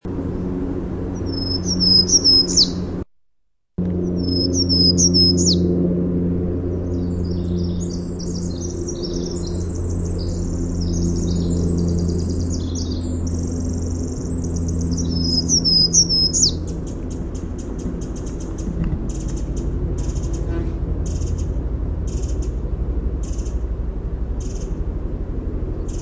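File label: Townsend's Warbler song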